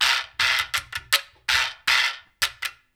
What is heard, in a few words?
Perc, Percussion Latin